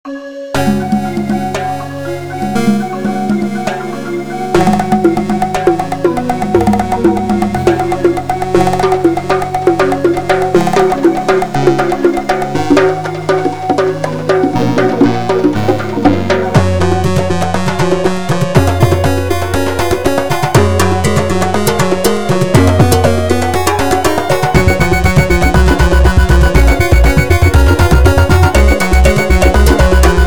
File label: drum